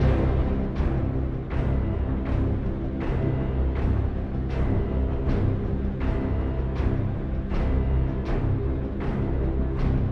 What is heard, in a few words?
Invasion Base Layer 80bpm 4-4meter; Planet of Lana